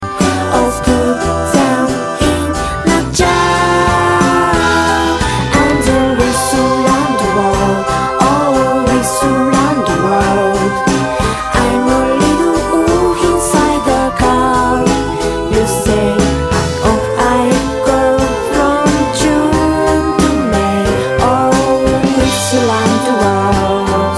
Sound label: Wolf Whistling Song